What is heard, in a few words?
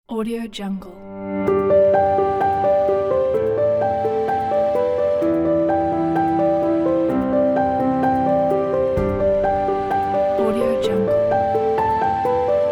emotional piano